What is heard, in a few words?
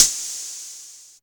HiHat, dry hihats; Quasimidi Rave-o-lution 309